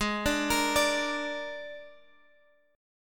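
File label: Ab Suspended 2nd Flat 5th chord, Guitar, Drop D tuning; 18,17,20,19,x,x; down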